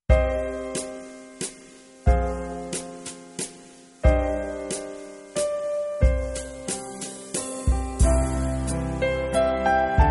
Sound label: waltz